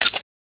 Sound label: Shutter